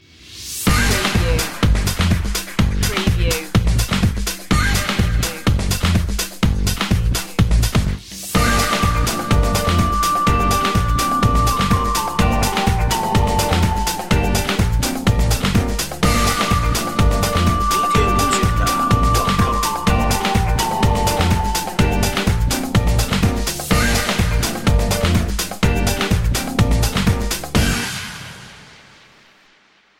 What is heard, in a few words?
bossa flute